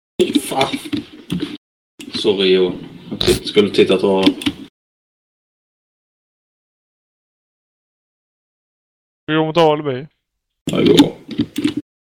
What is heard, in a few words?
scene mic